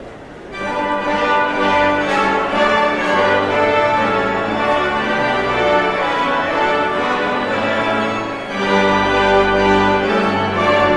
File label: Postlude